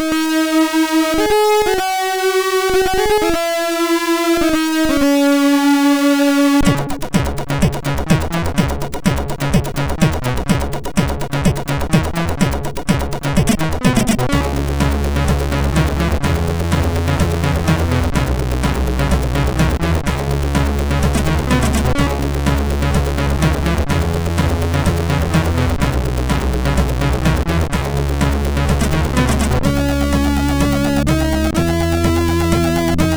Music sid, MOS6581R4; Music Demo

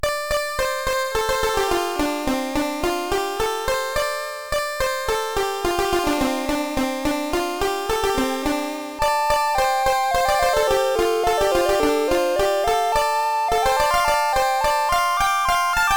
tape version CSG8580R5; Tai-Pan

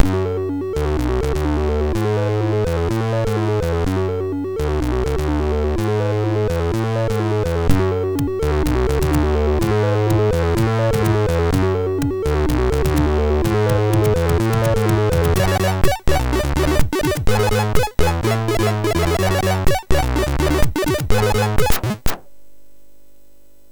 intro, MOS6581; MOS6581R3

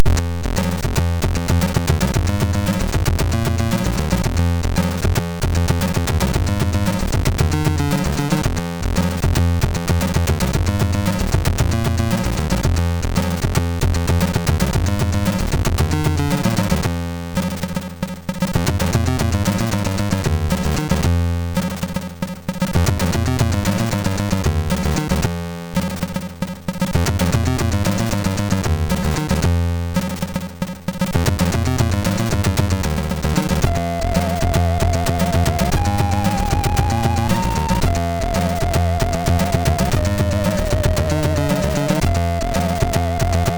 Funky, MOS6581R3

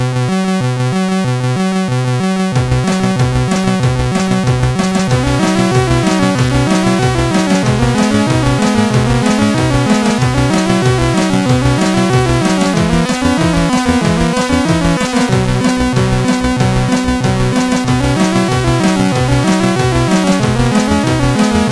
Melody, sid CSG8580R5

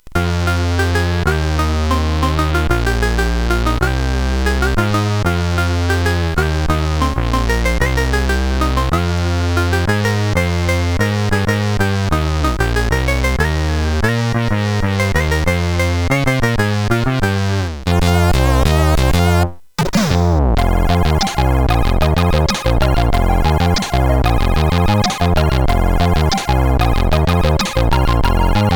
Push-It main, CSG8580R5; hvsc

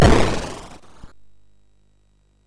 SFX, MOS6581; Narco Police

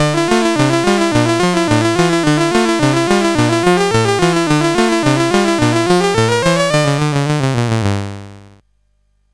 Laserwheel sid CSG8580R5

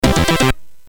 Jingles, CSG8580; hvsc

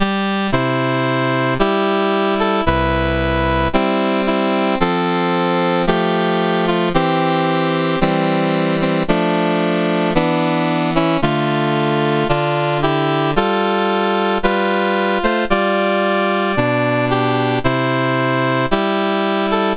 menus, sid CSG8580R5; Games Summer Edition

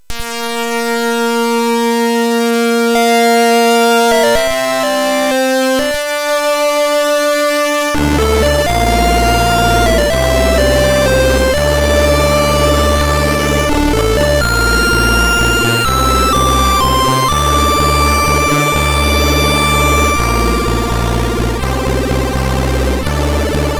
sid MOS6581R3; Back To The Future III

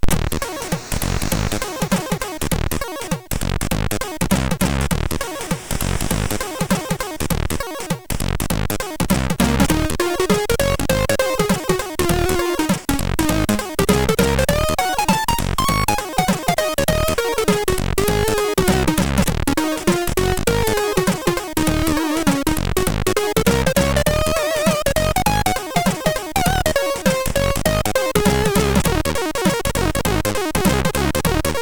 Jazzy, sid MOS6581R3

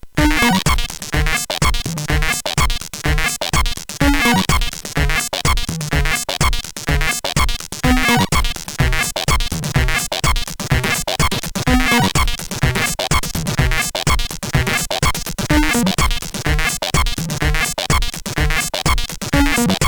Rise Phin Loop sid, CSG8580R5; hvsc

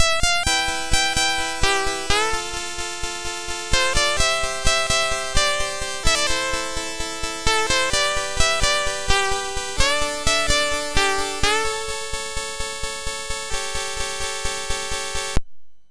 Pluck, MOS6581R2

